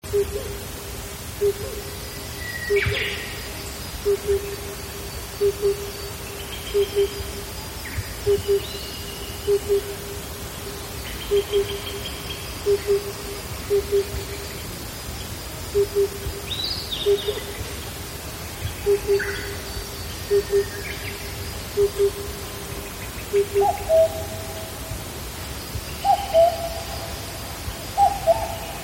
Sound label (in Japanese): tsutsu